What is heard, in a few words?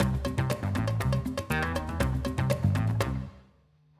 Spin jingle